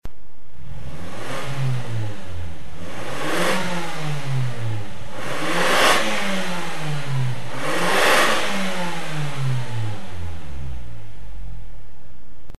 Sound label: Mazda5 Premacy CR, Muffler; AutoExe